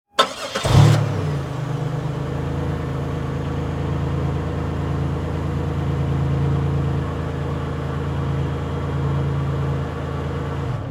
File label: Transportation Car Monte Carlo Start Idle Off Mic In Rear